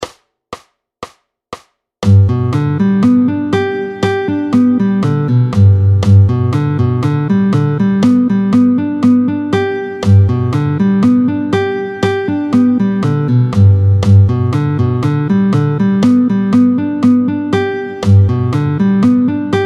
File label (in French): Arpege de Sol majeur. Vite 120, arpèges au médiator; Exercice chapitre 8 page 39